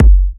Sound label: F#, Kicks; markus hakala